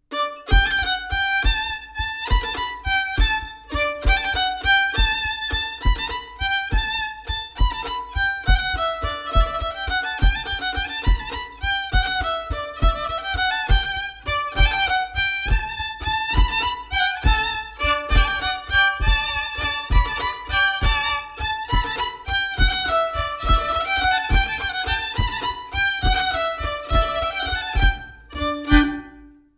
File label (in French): Bourree d Auvergne; Bourrees et autres danses antiques publiees par Elie Dupeyrat